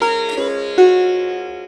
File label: SIT, India; Best Service; Ethnic Journey Sound Cube